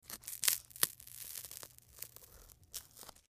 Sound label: MedicalScientific; Soundfx III